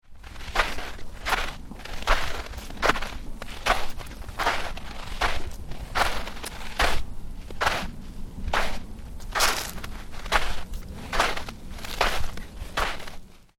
Footsteps snow light, Footsteps: sneakers walking on light snow, wind in background; Stuart Duffield